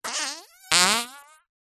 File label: cartoon fart